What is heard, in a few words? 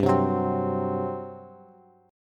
G Major 7th Suspended 4th, Bass; GM7sus4 strummed; JGuitar